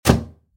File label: door closed